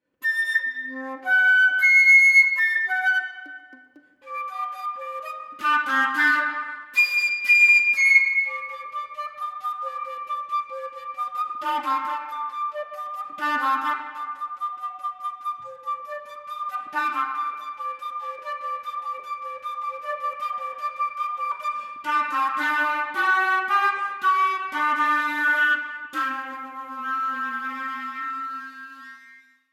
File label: contemp fl